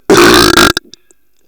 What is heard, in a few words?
burpie